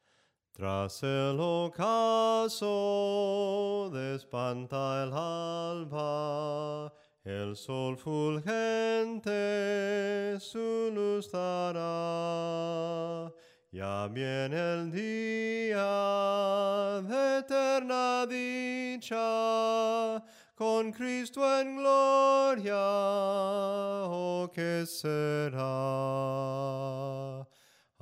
SOPRANO CANTADO, himnos en melodia soprano

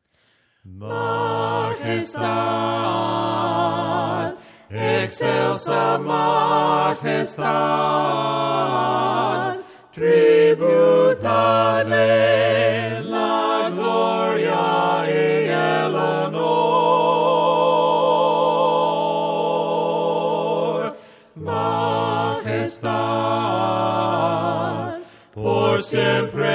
VOCES, himnos a capella; cantos del camino

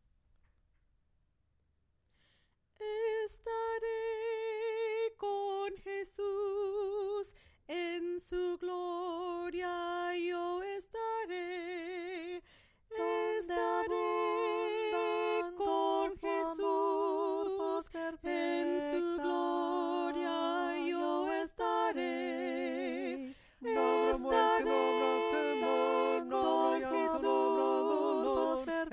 SOPRANO CANTADO, himnos en melodia soprano

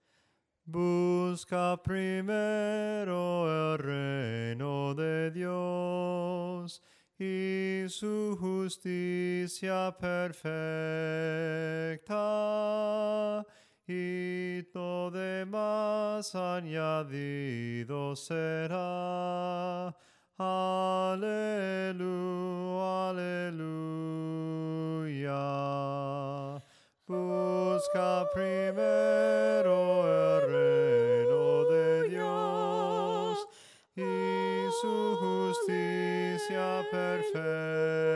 SOPRANO CANTADO; cantos del camino

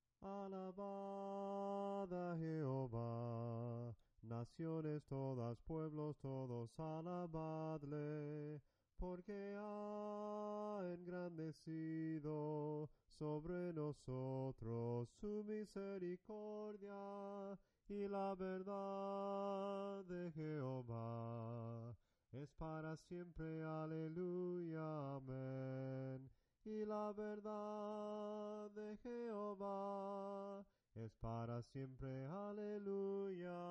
SOPRANO CANTADO, himnos en melodia soprano

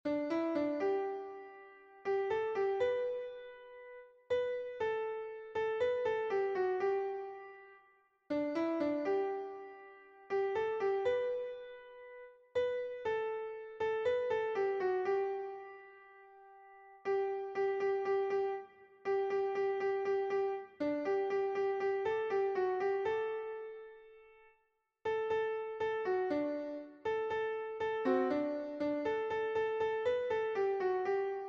soprano, himnos en piano; cantos del camino

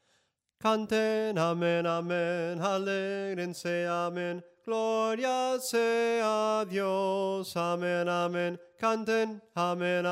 SOPRANO CANTADO; CANTEN AMEN AMEN

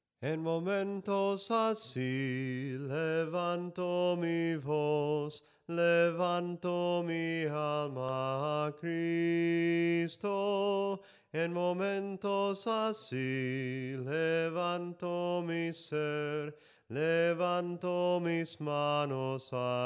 SOPRANO CANTADO, himnos

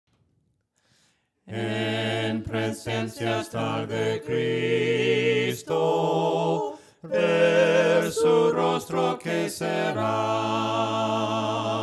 VOCES; EN PRESENCIA ESTAR DE CRISTO

